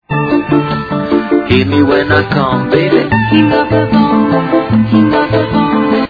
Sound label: bongobong